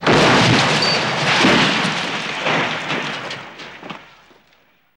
Car Crash Lng Impact, metal impact

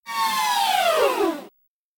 Crying Motor Down, servos

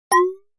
Pop Up Liquid Pop

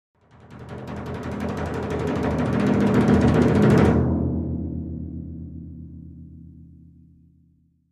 Timpani Hands Roll; mallets timpani

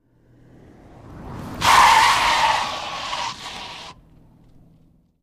Car Fast Skid, skids autos

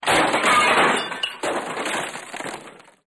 Debris Crash Crashes

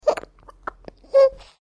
Baby Swallows Gurgle

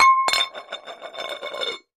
Dish Ceramic Bowl Dro; clay & ceramic

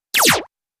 Laser Zaps

